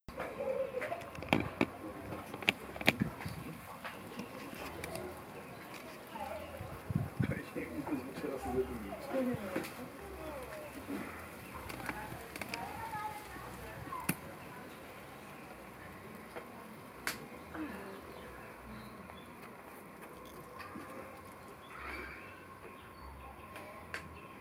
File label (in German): Sonnenuntergang (Original Video Ton), Curacao; Fieldrecordings